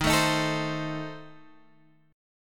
A Diminished, guitar

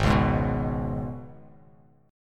Gsharp Minor Double Flat 5th; strummed; 4,4,6,4

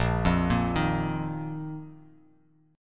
Bb Suspended 4th, Bb, down; Bbsus4 arpeggiated; JGuitar

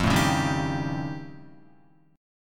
F Augmented 9th F Guitar, chord; F+9 strummed; JGuitar

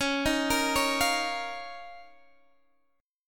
D sharp 7th Suspended 2nd C sharp, Guitar

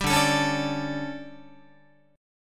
D Major 7th Suspended 4th, Guitar

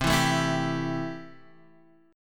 C 6th C down, chord; strummed

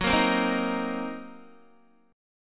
Gb 6th Flat 5th Gb down Guitar

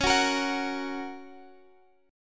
Gb Major Db

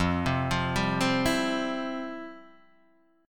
F Major 7th Suspended 2nd, F, down, guitar; arpeggiated; JGuitar

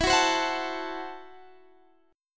Gb Minor 7th Flat 5th, Guitar

